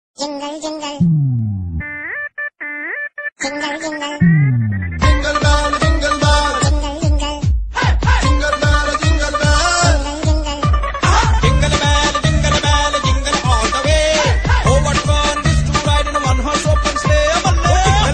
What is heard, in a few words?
Punjabi Jingle Bells Ringtone; ringtones